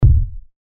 こん棒があたる; 武器効果音

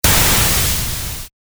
ミサイル発射 モノラル

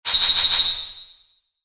ランク レア度4 モノラル

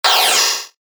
扉を開ける 近未来 モノラル

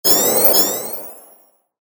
タメ

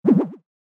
ポワン コミカル; 場面切り替えや心理描写; 演出効果音

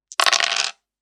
サイコロを振る 三個

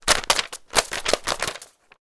札の音; ボードゲームやカジノ、麻雀の効果音です。

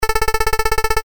会話

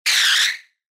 モンスターの鳴き声

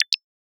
メッセージ

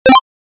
決定 レトロ; モノラル

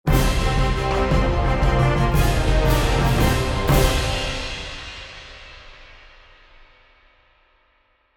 海賊風の アイキャッチ; 商用利用可能な無料のアイキャッチの楽曲です

